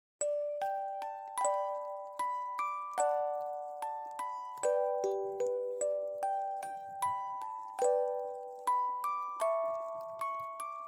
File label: 8 Melodies Music Box